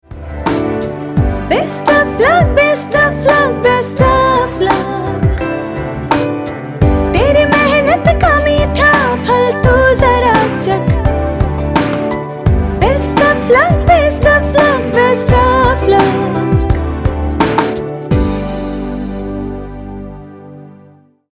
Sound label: BEST WISHES TUNE FEMALE